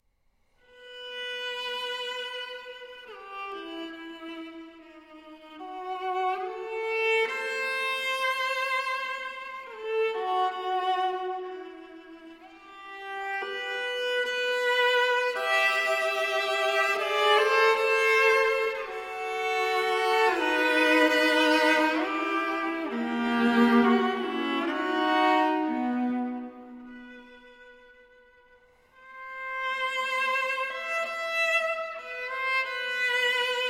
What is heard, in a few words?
Suite for Solo Viola in E Minor, Moderato; Reger; Op. 131d No. 3 I. Moderato